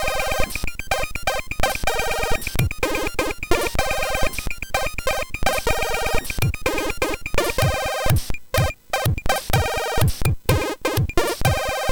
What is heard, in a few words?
Oriental; MOS6581R4